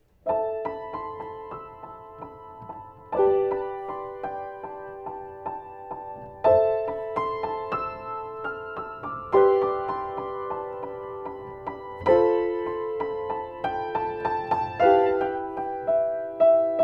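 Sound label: Piano, vintage AKG C414 COMB condenser; SHAFER BABY GRAND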